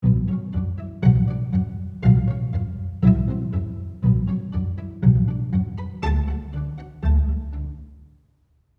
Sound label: pizz; Hall of the Mountain King; Spitfire Aperture Pinhole